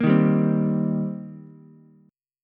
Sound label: C Minor 7th C Ukulele; Cm7 strummed; JGuitar